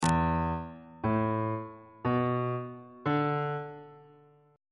f flat dominant 7th suspended 4th chord intervals on bass clef